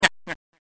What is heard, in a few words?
nyuck